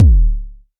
warm bass drum sample d key, kick sound tuned to the D note